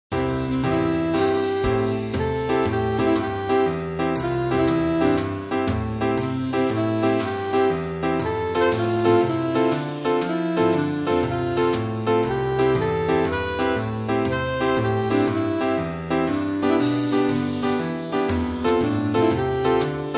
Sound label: melody